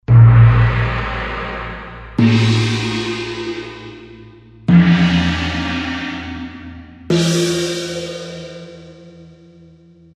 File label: China Gong, Roland SR-JV80-14 World Collection Asia